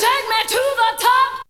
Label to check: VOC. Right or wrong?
Right